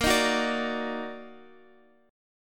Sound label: D sharp Minor Major 7th A sharp